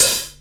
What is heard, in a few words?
tamed open hat sample b key, Royality free open hat tuned to the B note.